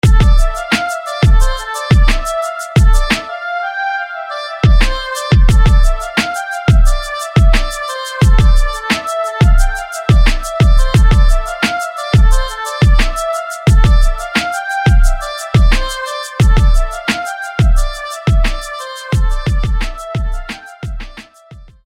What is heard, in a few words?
Cloud Flute